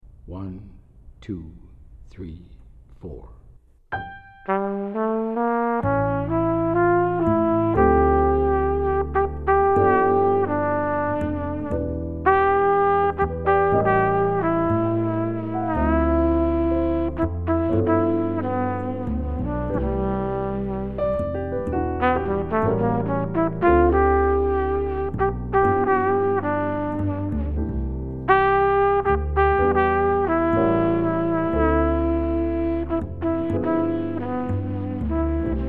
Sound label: minus Drums